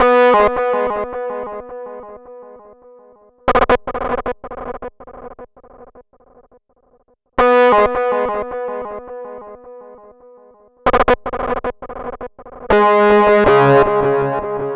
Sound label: Distorto Lead, EDM; Sonic Foundry (Sony Creative Software); Afterhours EDM; Ibiza Underground